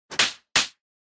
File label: Hit Slap, Dry